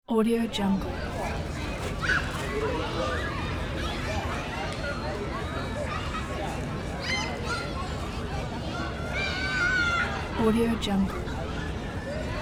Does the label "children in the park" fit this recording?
Yes